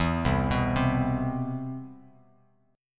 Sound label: C Major 7th, Bass Standard; CM7 arpeggiated